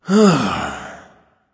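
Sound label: non-verbal sigh; smite; voicepack; houyi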